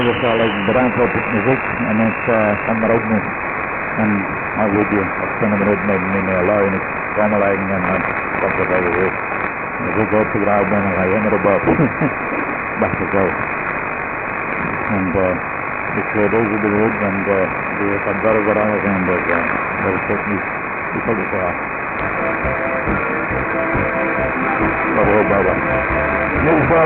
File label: 1642 kHz; Edelweiss